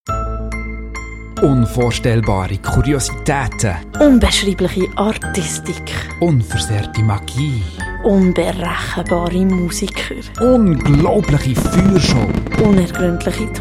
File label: Jingle